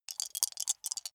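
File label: small three rattle in hand closed short, dice; wuerfel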